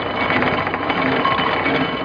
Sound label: wd gear; outlaws